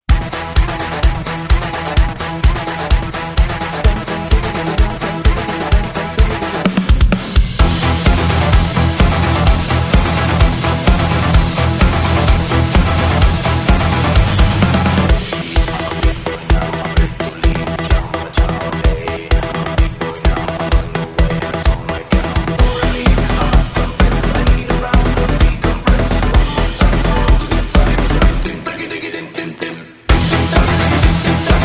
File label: Karaoke Version